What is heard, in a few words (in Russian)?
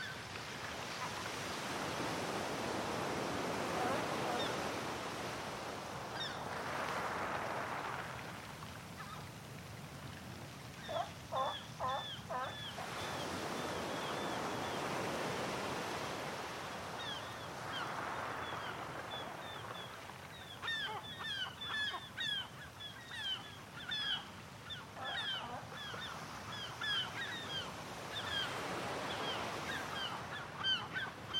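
крики чаек, берег моря; kriki chaek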